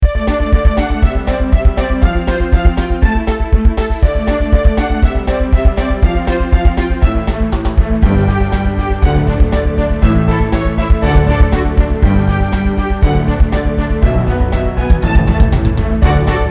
Orchestrated

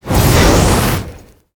Monster; Zenless Zone Zero

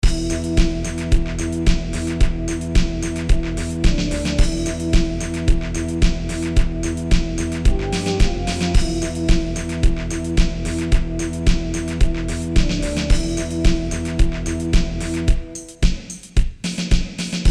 space synth fragment

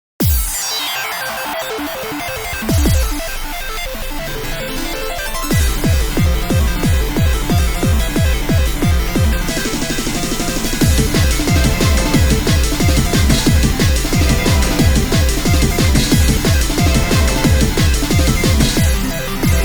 Racer Beat

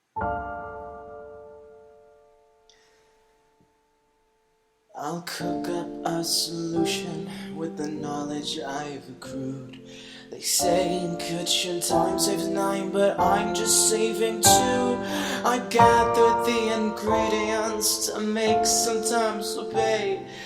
piano cover

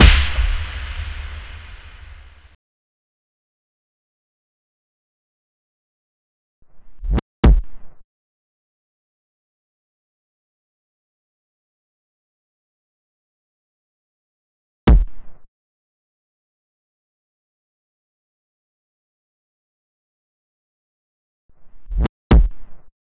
drums; Pony Fan Music Remix Stems

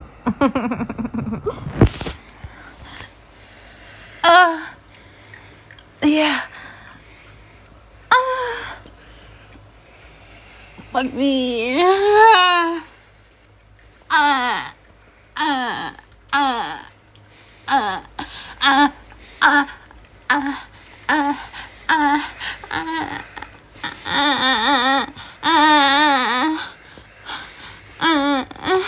screaming crying rough moaning; [F4M][NB4M] The Fuckening; Getting Fucked 7-- Special

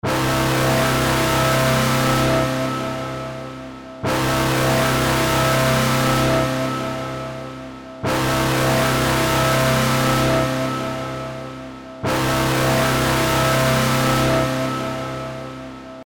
emergency siren noise warning; ambershyr

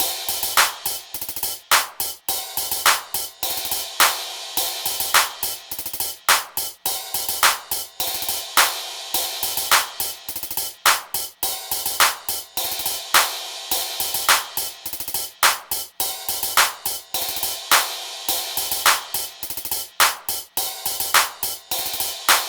Epic Hip Hop hats and claps; Pony Music Archive

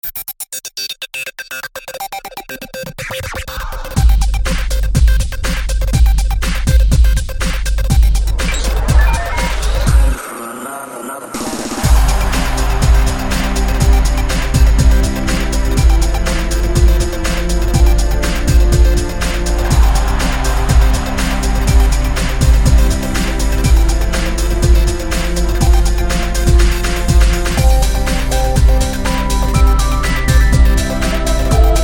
Beat

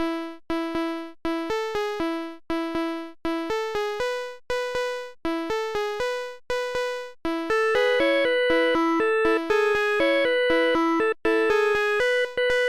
Flim Flam Jam Instrumental; My Little PxTone

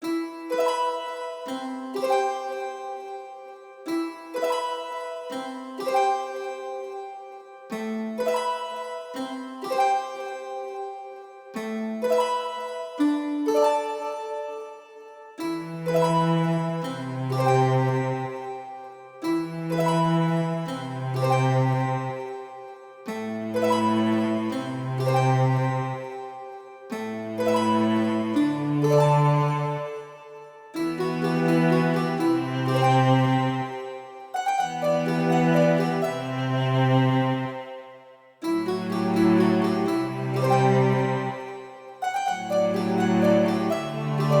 duet; Symphonia in Duos Competitores; collab with Vinyl Scratch

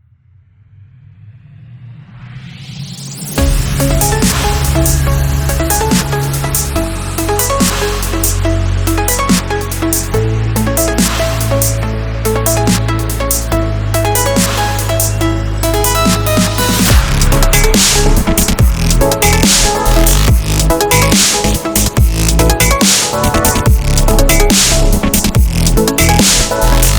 Remix (Instrumental)

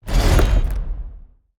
Monster; Zenless Zone Zero

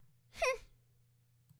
that's a laugh

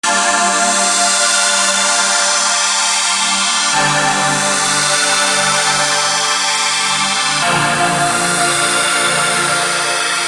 RANDOM SNARE PAD

